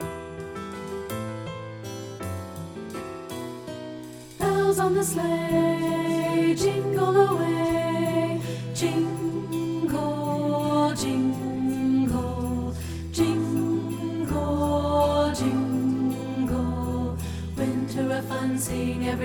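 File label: soprano alto tenor bass (Alto Dominant)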